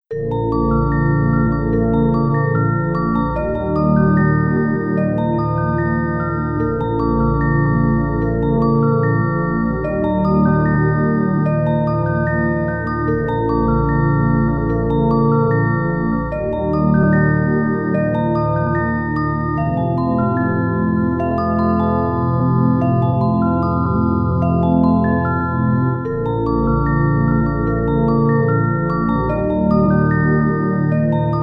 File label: tribal dance 432 Hz; Plemienny taniec; Czakroterapia